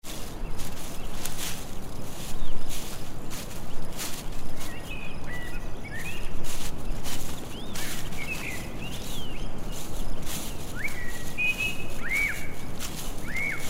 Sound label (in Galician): camiñar campo son paxaros fondo